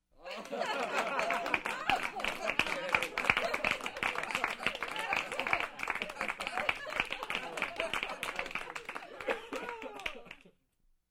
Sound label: Applause and Laughing